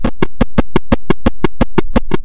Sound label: horse gallop; Creatures